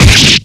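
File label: Battle Rapier Critical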